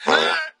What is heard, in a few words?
fart short strain